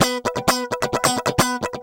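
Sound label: guitar, 130 BPM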